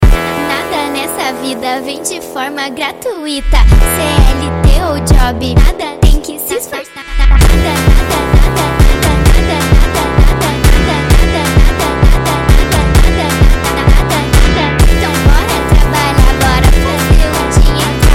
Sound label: PHONK